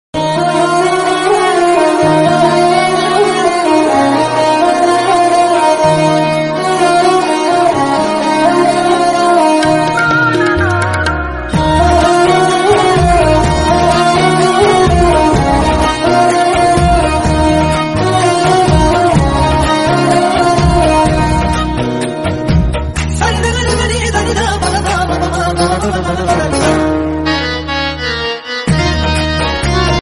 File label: instrumental BGM ringtone